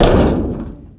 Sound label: land metal vent, footsteps; player